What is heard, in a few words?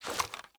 lean in; ins2; weapons